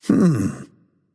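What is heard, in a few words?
non verbal thinking, voice; cernunnos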